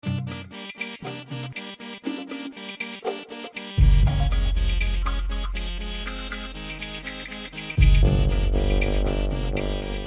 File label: Turbine Puzzle Music